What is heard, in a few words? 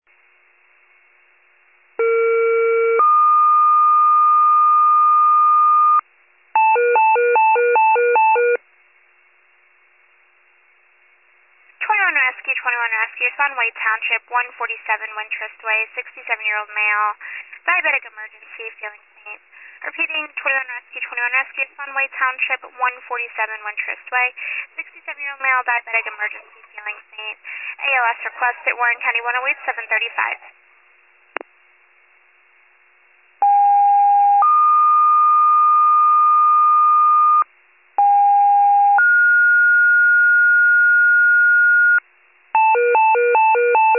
fire, pager alert